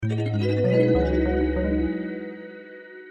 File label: Jingle Menu; Picross